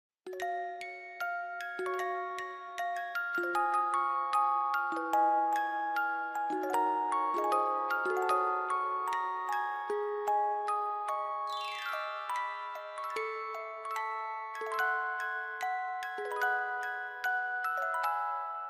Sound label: music box ver.